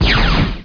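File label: plasma heavy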